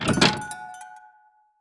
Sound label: Door Bell; d4dj groovy mix; android